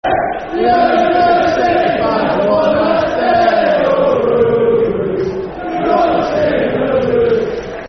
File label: Football Chant (Soccer Songs)